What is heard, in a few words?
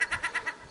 Alarm call Gull